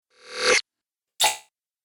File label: Droid Wet, robot